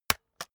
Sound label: keyboard external space hit slow